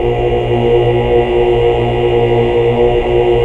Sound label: HAL OOH OOOH Oct